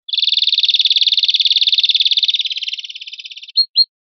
pinzonada; verderon

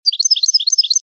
floreo chavi chavi, campero

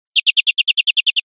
troteras, verderon

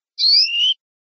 quejas pui, verderon

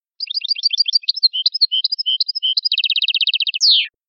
rulada

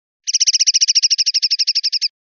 rep carretillas, pardillo

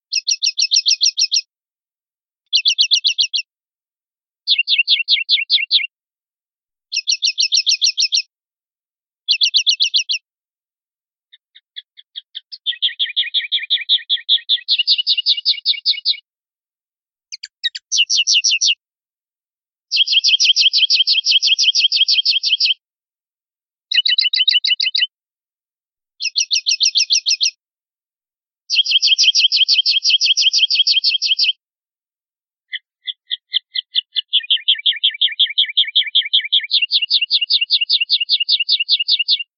rep chachases, pardillo

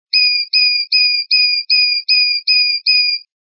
titeos

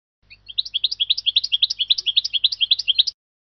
campanilleos capi capi, campero

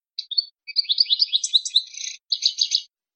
gorrion; campero

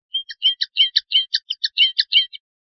rep primaveras, pardillo; linked files test pardillo

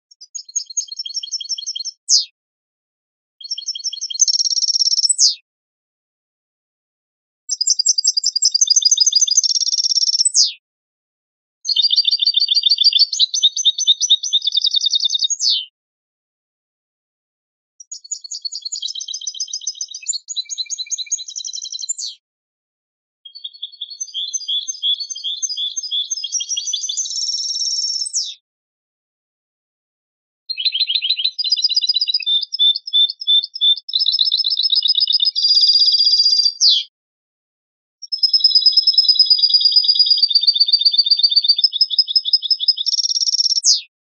enlazadas rematadas

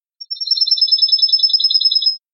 cascabel

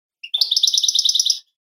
cascabeleo golpeado, campero

